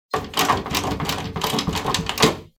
扉をがちゃがちゃされる音; door

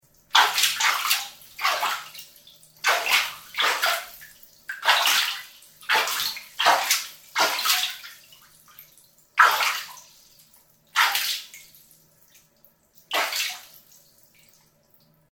お風呂のお湯で遊んでる音

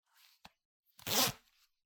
ジーパンのジップを上げる音

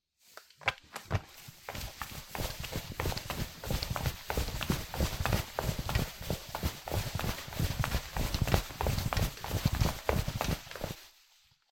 フローリングを歩く

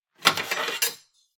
包丁を取り出す音

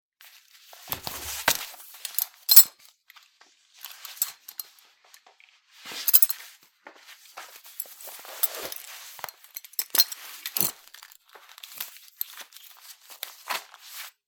ベルトをつける音

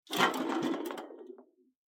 空の缶が転がる音

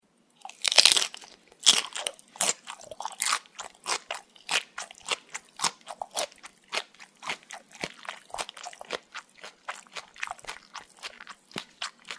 ピーマンとひき肉の咀嚼音 口閉じてる

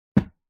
脱いだ服をシーツの置く音

